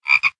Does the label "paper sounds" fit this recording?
No